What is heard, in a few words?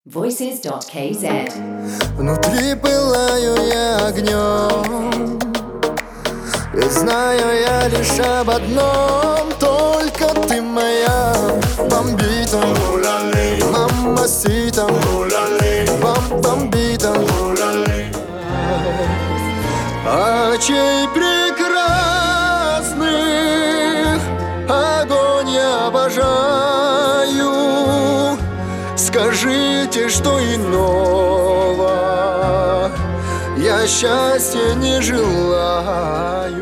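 vocal Russian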